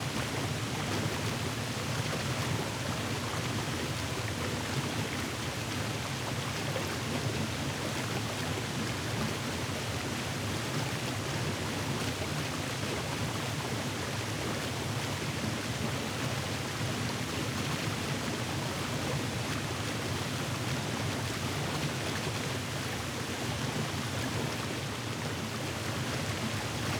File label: waterfall bottom; Rust